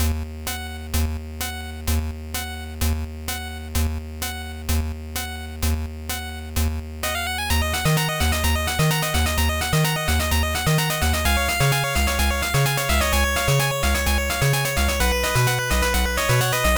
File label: Bell; Necrow Co; Linux Virtual Boy Windows gamerip